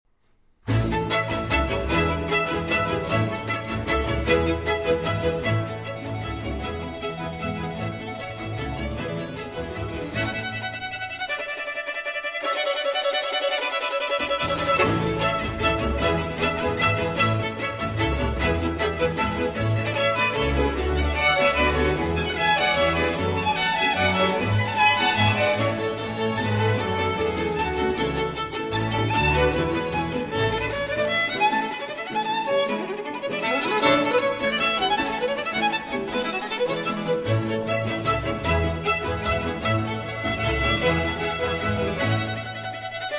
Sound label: Concerto For 2 Violins in A Major; L'estro Armonico No. 5; Vivaldi